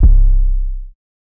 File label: 808, plugg